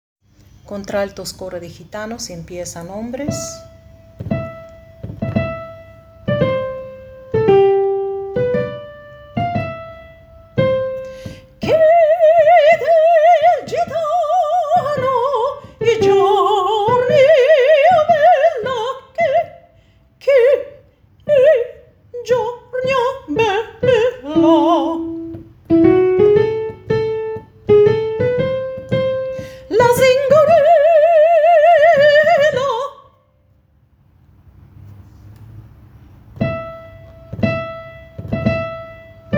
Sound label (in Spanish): contraaltos